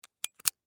Mic Cable Connect, Technology, Mic, Cable, Connector, In, Click; Microphones; BlastWave